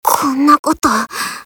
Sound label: death; csgo; ump45